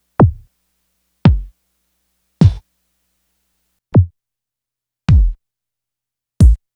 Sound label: Kicks